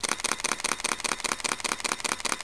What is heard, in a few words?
Canon EOS 30D Burst